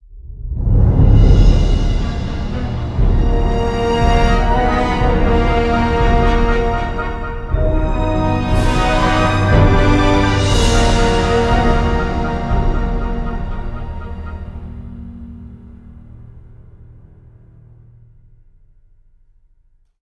Hatchery Music; Jurassic World Evolution 2; PS4; Windows; Xbox One; Xbox Series X/S

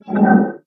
UI Map Tap; Taps to Riches; android

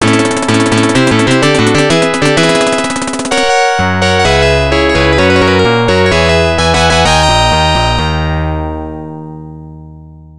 STAGE CLEAR; commando arcade

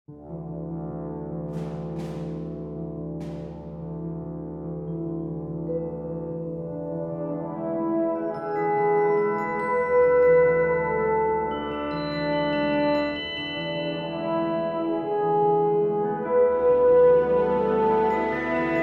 World Music

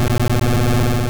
Dynamic Punch; attack moves; pokemon; gen 2; gsc

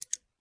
Click; Learn to Fly 2

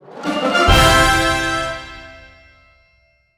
Fanfare; Ever Oasis

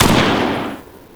GEWEHR43

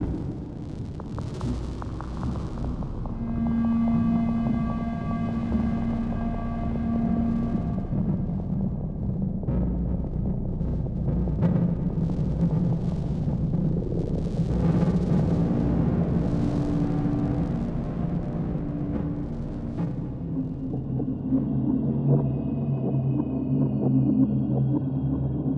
Suspense; Tealerland

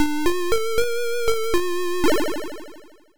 Miss; Monster Business; atari st

